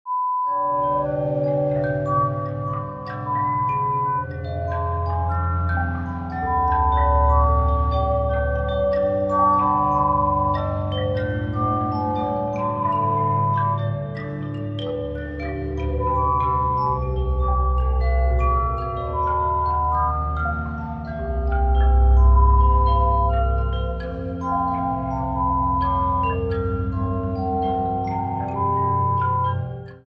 Creepy Atmosphere, Horror Sound Effect

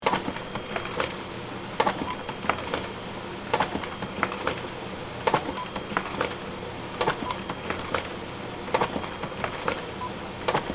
Computer Printer Printing

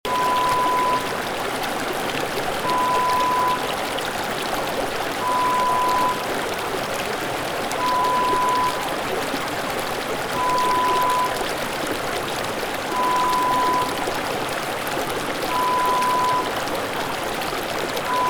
Babbling brook

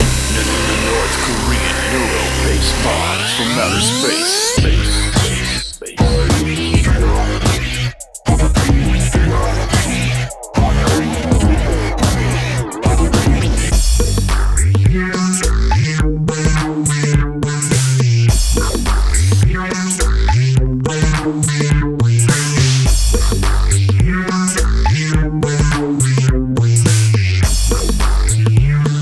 Neuro Bass Bombz; North Korean Neuro Bass Bombz from Outer Space

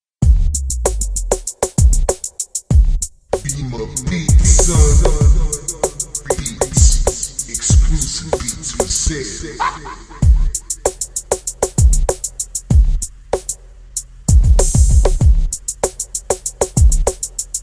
Old School Juice Hyphy Hip Hop

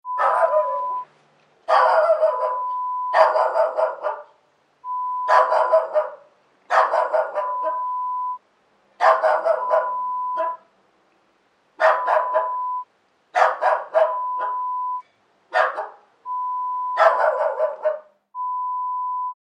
Dog Barking sound

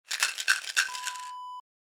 pill container

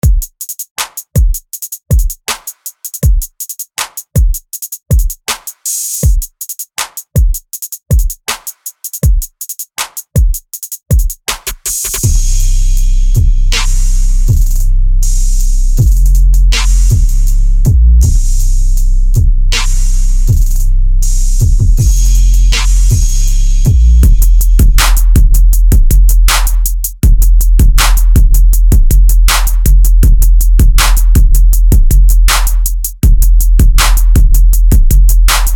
trap drums

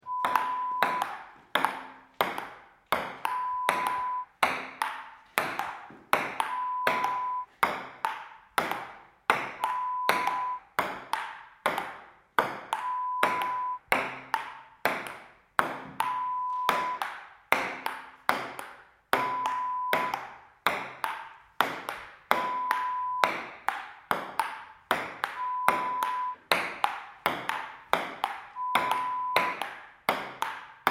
Ping Pong Medium

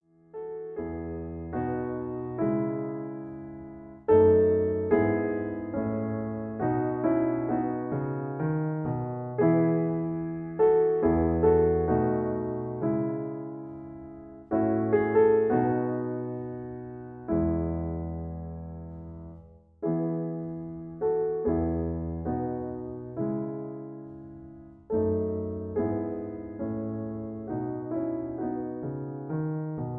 Accompaniment E

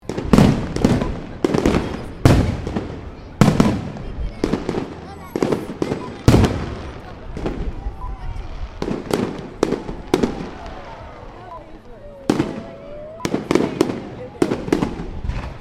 Fireworks Display

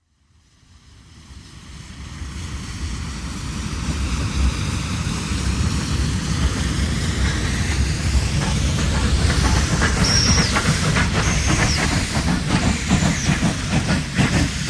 Steam locomotive arrives

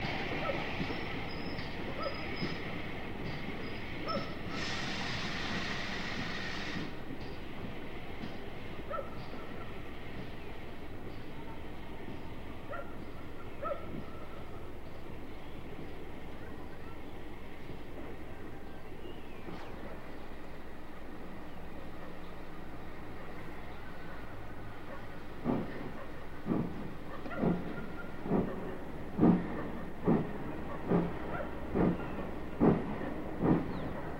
Coal train

